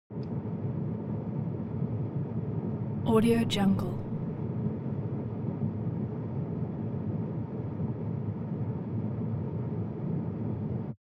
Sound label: transportation sports car int ventilation step